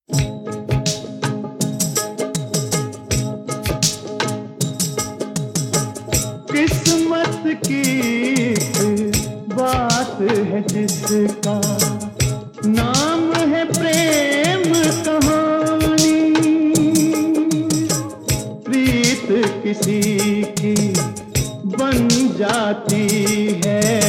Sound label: male jhankar beats